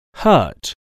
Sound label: hurt